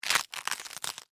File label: Tear Rip Cabbage, Texture, Tear, Rip, Cabbage, Head, Rubbery, Vegetable; Ripping; BlastWave